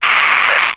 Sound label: mr pain, mutant runner